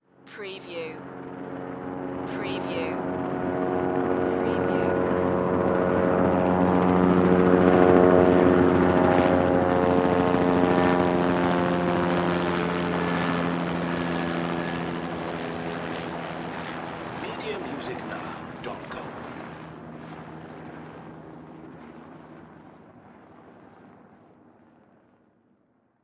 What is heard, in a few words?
Helicopter Pass By